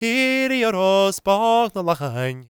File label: GAELIC, World Voices; ILIO; Vocal Planet